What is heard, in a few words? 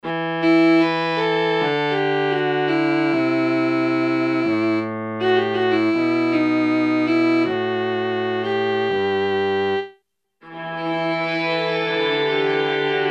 SPIRAL, Boss SX-700; Factory Preset